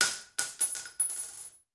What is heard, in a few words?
Bullet Shell; Sound Ideas; Guns